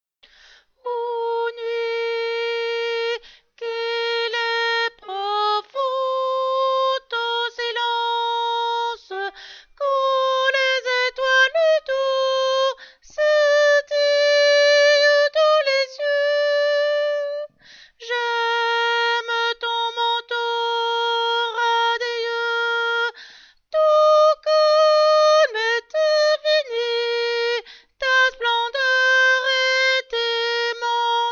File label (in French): Hymne à la nuit